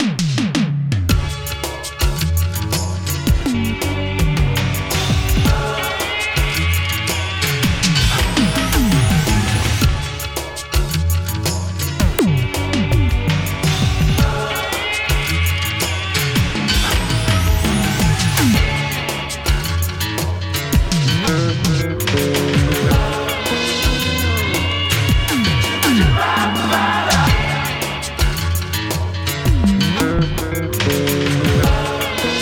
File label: techno safari; Idlesoft adcrypter pro